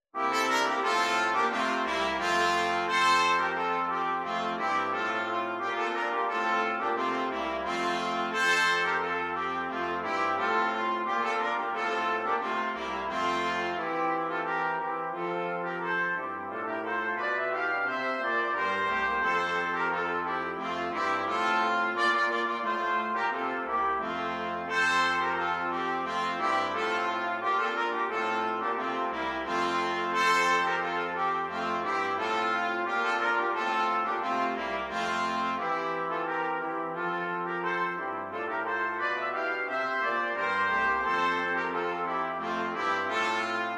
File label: Brass, brass quartet; Deck the Halls